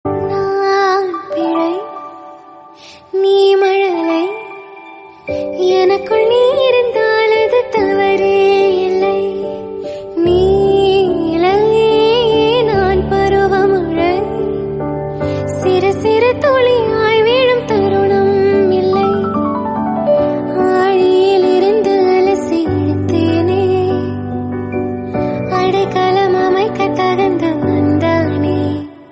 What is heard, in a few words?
Female Ringtone